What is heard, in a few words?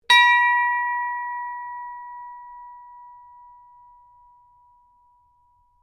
Ships Bell Ring; Various ships bells; Boats; Vehicles; HMAS Shropshire